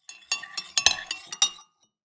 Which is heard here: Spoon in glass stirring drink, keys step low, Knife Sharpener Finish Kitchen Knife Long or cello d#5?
Spoon in glass stirring drink